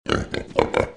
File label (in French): Cochon, Animaux; bruitage